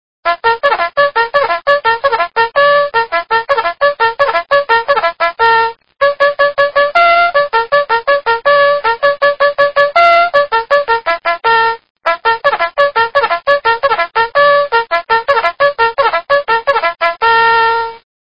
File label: Reveille Bugle Call; history; military